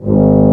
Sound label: Tuba mf G#0; Digital Sound Factory Virtuoso